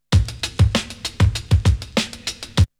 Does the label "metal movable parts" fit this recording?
No